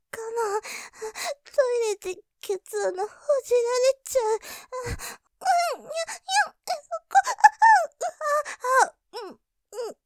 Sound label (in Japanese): 喘ぎ; トイレでケツ穴ほじられちゃう！; ループで楽しむ！シェフの気まぐれ抜き抜きコース; モノラル